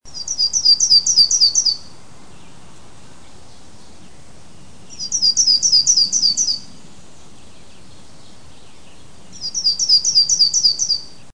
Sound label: Yellow rumped, bird sounds